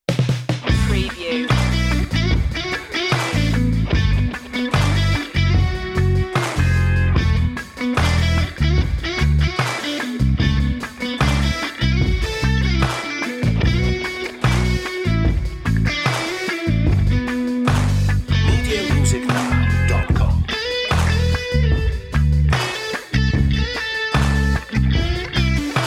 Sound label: guitar lead no keyboards